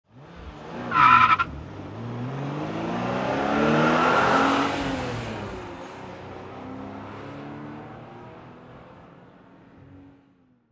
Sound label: Sports Car Racing; Transportation; iLife Sound Effects